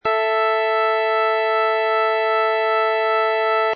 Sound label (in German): Quinte; werckmeister - stimmung